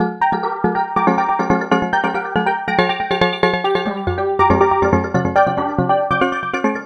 Synth Arp; Trip-Hop

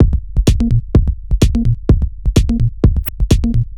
Pulse C# 127bpm, House Drumloops; 90 s Sample CDs; Zero-G House Fabrik Drumloops; ZG Drumloops

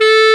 CLARINET A3, WIND; AKAI S-Series CD-ROM Sound Library VOL-2

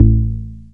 BAS A1, 2 Step; USB Soundscan vol.51; House Side Of 2 Step; AKAI; BASS

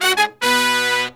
Pop & Funk Brass

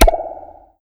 FX SWEEP

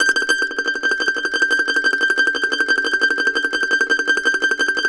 MANDO TREM G6, Whole Lotta Country; Sampleheads; Whole Lotta Country 1 & 2

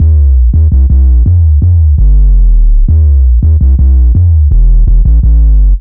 F2 165bpm, Drum & Bass, Bass Loops; Total Drum&Bass

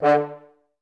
FH STAC D2; Best Service; Peter Siedlaczek's Advanced Orchestra

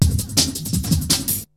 BREAKBEATS, TECHNO

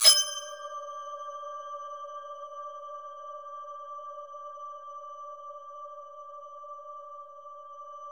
GLK 2, GLOCKENS; Bolder Sounds - Granular

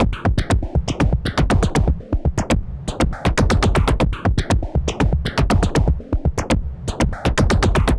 Anabeat Mix, Beats

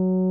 RHODES F#2; Sonic Reality - Vintage Timetraveler

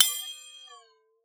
water; Xsample VOL-9

